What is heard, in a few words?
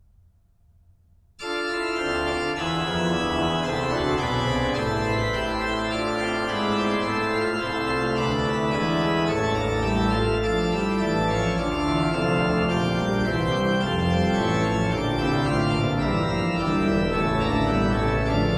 Christ lag in Todesbanden, BACH ORGAN, Chorale preludes; Orgelbüchlein